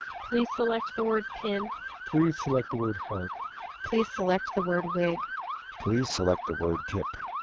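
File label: EVS wideband siren; Codec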